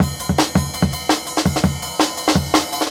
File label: 165 Wonky, Jungle Breaks; 90s Sample CDs; Original Jungle Breaks